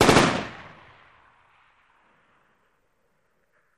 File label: Machine Gun Sngl Rap, single burst